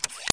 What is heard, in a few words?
menu restore; Penotes